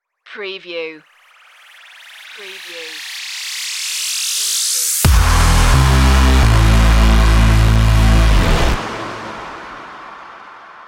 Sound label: gimme a beat stinger